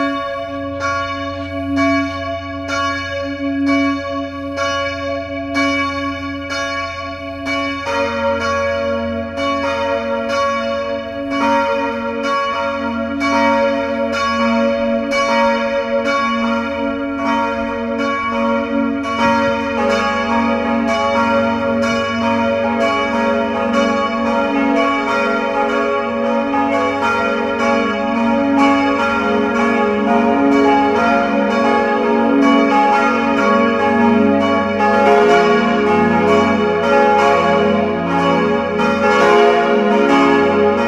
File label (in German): Glocken Kernstadt; Bad Vilbel